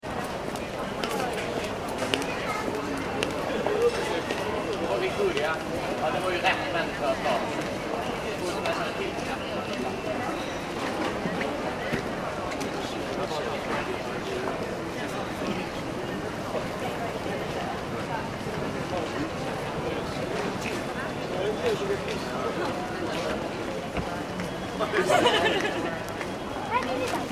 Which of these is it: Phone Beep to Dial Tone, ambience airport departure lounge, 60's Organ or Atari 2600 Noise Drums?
ambience airport departure lounge